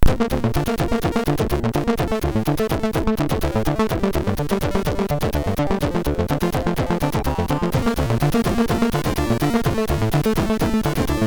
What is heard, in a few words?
sid 6581R3